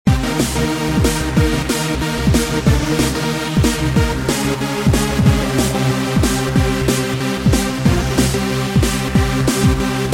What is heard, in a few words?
synth tram